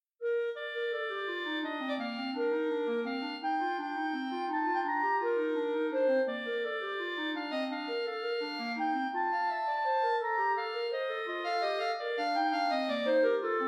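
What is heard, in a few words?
air in C major 2 clarinets; hwv 597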